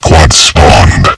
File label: Power Quad Spawned; cstrike; powerup